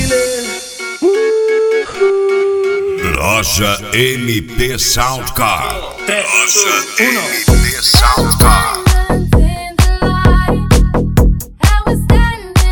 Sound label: sound car; mt sound car